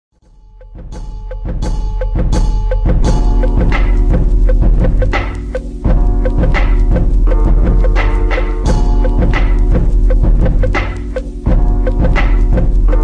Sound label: instrumental loop